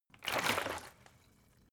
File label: bottle water big upend; berufe